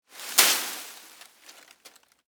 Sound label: Foliage Drop Move, Palm, Frond; Texture; Rustling; BlastWave